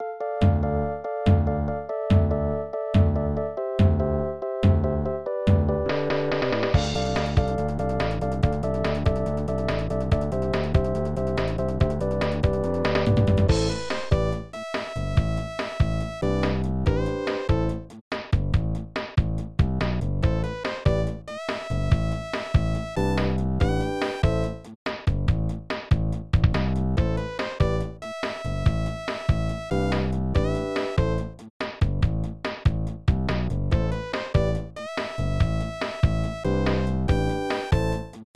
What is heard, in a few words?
Pause Music; madden nfl 96; snes